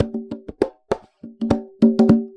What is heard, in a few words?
Conga Loop (100BPM); musicradar